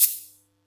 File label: Yamaha EX-5